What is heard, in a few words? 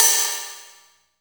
DMX ride; Greytsounds; DMX KITS